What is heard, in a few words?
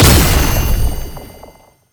Lunge Impact Perfect; Warframe; Oraxia